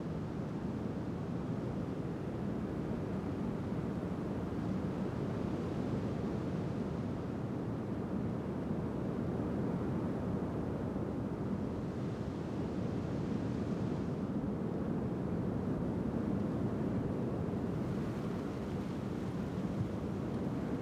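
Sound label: wind low ambience; ambient; anubis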